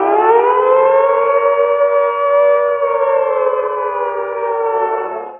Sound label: kamikaze warning; pirates of the atlantic windows gamerip 2006